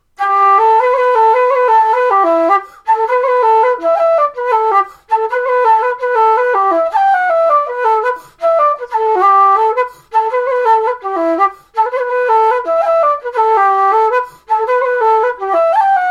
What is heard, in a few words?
The Miller's Maggot - fast on flute; Jigs